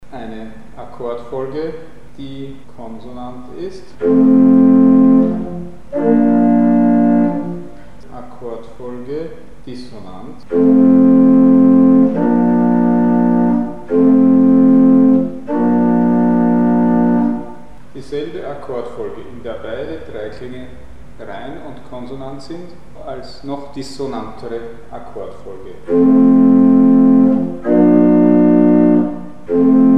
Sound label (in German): Akkordfolge konsonanter und dissonanter